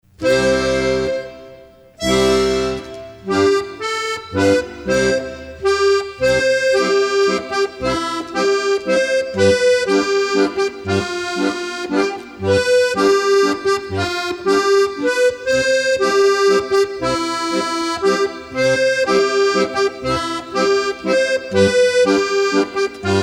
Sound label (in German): baurischer laendler